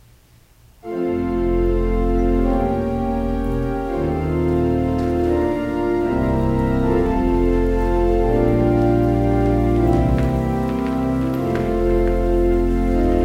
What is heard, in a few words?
Organ Music; True Blessedness; Netherlands Reformed Congregation, St. Catharines, ON